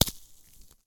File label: ice, Ice Cliffs